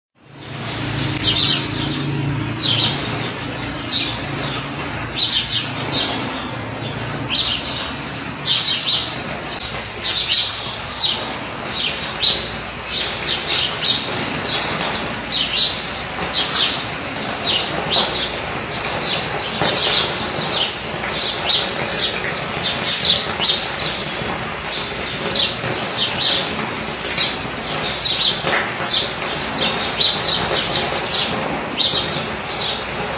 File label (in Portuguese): pardais cachoeira ao fundo; festival virtual